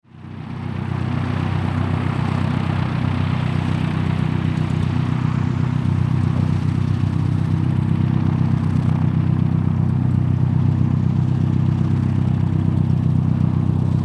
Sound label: Airplane Cessna Idle, A Cessna propeller aeroplane motor idles; Airplane Sound Effects; Part of the LYN109 package of Aircraft sounds